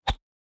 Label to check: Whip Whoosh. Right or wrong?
Right